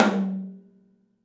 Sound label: TOM; 3D Sound; 3D Drums